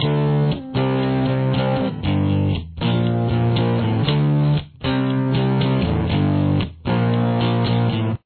chorus, guitar

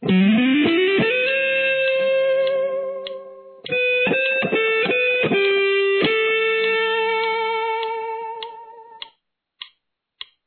lick, guitar

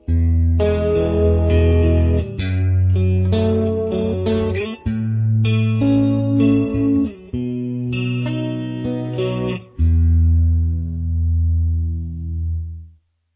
E major, guitar

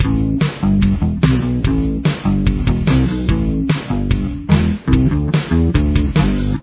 riff 1 bass

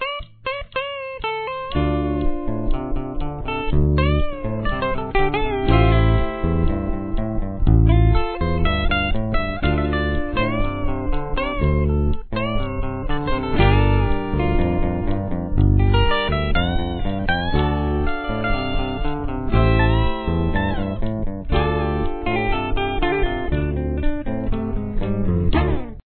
solo

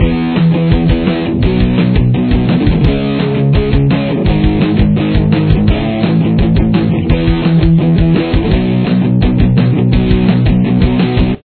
guitar

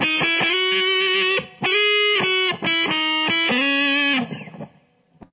lick, guitar

